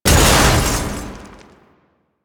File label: Loot Crate Open; Objects; Narmer; DE; Warframe; Storage Container